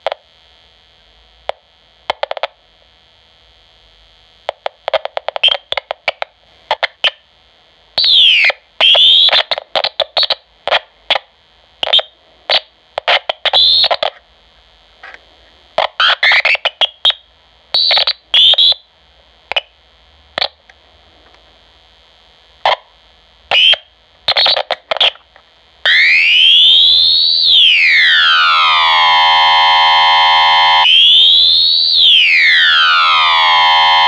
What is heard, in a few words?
defect oscillator